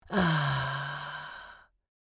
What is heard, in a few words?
Female mature woman sexy moan